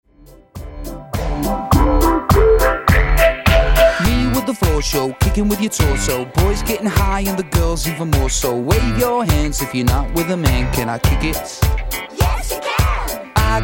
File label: Dj Intro Outro 103 Bpm